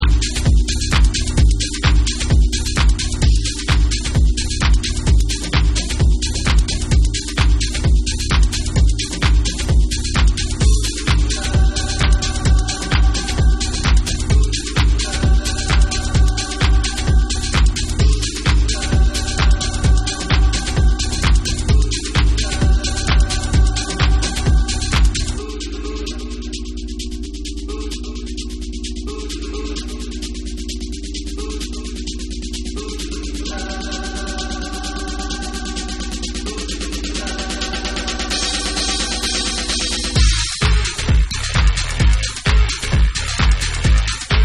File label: BASS GENERATION Snare Mix